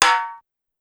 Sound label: Snare Edge, Pitched Up; Clavia DDrum-AT